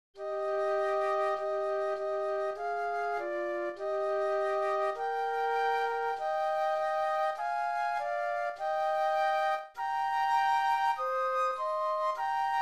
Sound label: Flute Duet